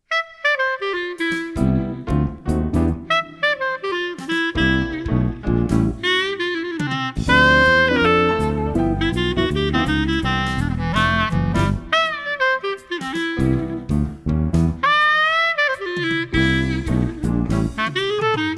saxophon ringtone; android

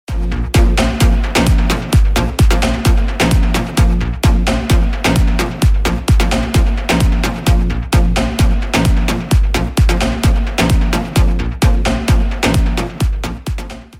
trance ringtone

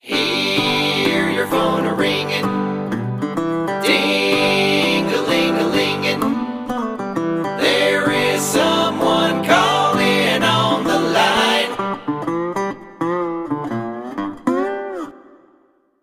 country folk ringtone

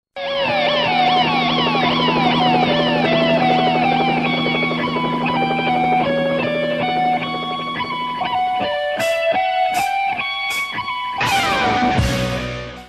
ambulance melody ringtone; android

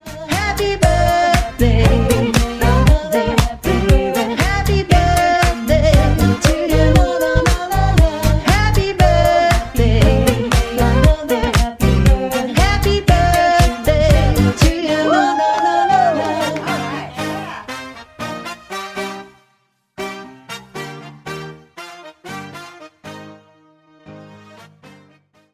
happy birthday ringtone

